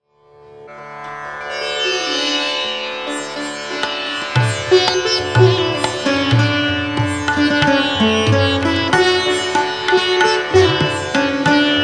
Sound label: hansadhuani gat; Raga Hansadhuani - Gat in Tintal